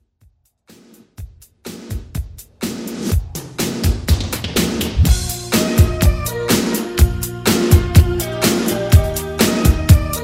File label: 80s Drum Rework